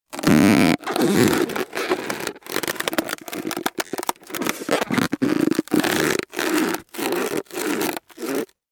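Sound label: balloon crumpled with squeaks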